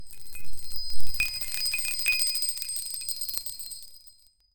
soft squishy slime sounds; electronic pulses

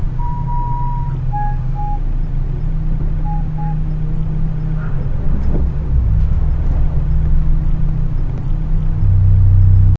bus rumbling muffled snow

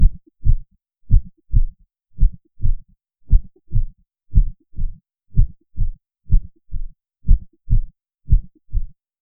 people whispering with

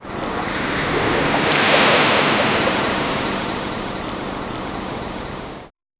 calm ocean waves crashing, cliffs

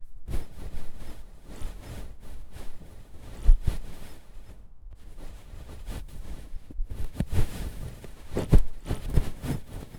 fabric rubbing against

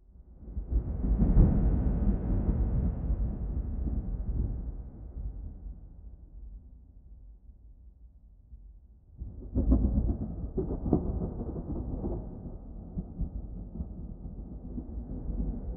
faraway thunderclap with

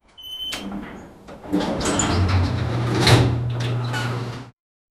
elevator doors trying to

